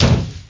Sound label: thud; alienbreed3d2